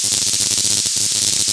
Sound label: electrical hum; svencoop